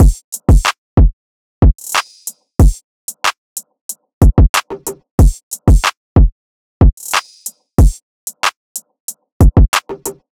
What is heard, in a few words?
Drum Loop 185 BPM; Stomp It; Cymatics; Mystery Pack Vol 4